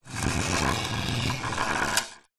household water sprayer bottle plastic small scrape base edge rim on concrete